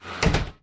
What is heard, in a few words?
Close Door Wooden